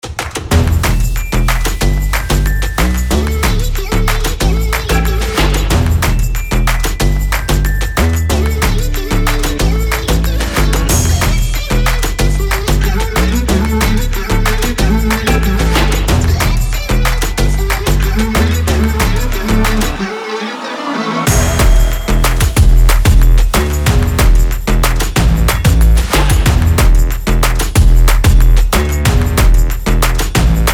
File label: Summer Energetic Fashion Percussion Stomp